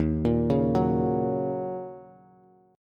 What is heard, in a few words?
Eb Minor 7th, down, Bass; arpeggiated; chord; JGuitar